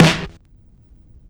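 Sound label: Vinyl Snare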